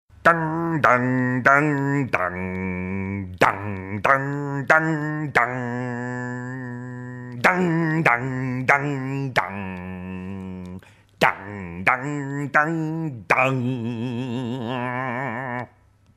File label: Big Ben